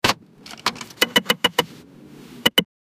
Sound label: car accident